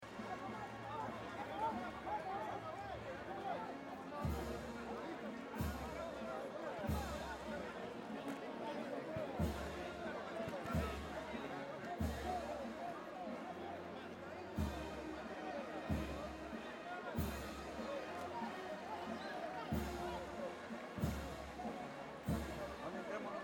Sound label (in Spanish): procesión con gente; caminar; caminata; fiesta; música; SEÑOR DE LOS MILAGROS